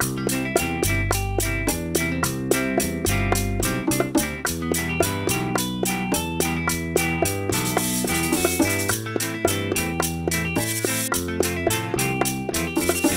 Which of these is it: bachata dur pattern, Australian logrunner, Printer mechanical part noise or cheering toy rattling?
bachata dur pattern